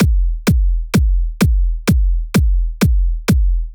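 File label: Drum Loop bass drum; bsounds Vol.5; VEC5 Drumloops; VEC5 Multi Loops; Drum Loop 40 Loop Elements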